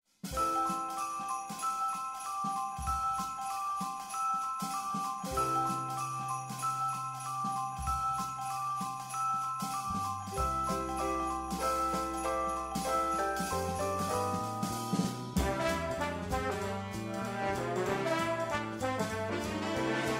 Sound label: Winter Music Jingle Bells Theme